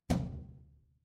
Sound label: impact metal hit